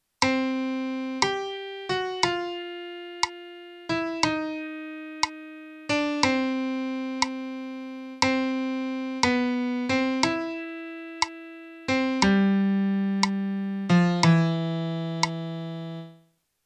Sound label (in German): melodie; theorie; Melodiediktate